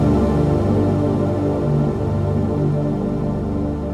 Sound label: 122 BPM Pads Amaj Gmin Tonic G, ambient synth pads; MMB